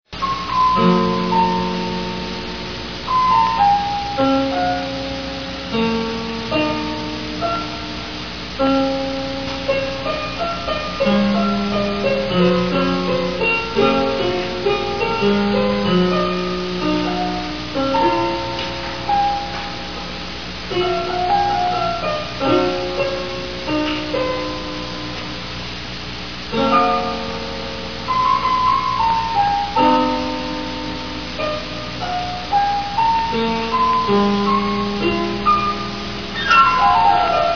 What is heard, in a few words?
piano